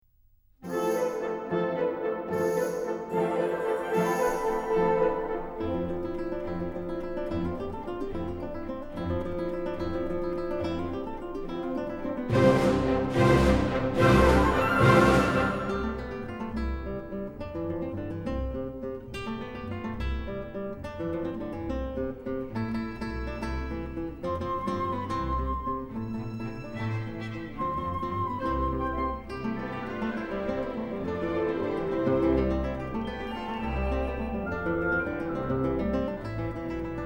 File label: MUSIC Guitar Concerto